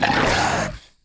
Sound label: Weasel; EmuClient